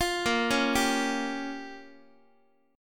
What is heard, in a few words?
Bb Minor 6th Bb down Guitar; arpeggiated; JGuitar